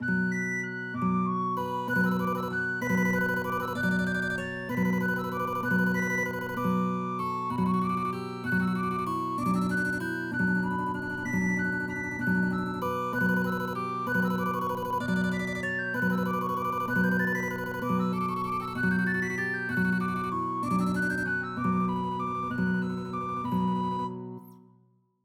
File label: Song for Italy; MTWFC VOL1 Audio Accompaniments